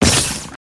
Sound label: Spider Web Attack; creatures mechanical